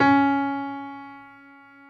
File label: PF ST C#3, Piano; AKAI S6000 CD-ROM - Volume 1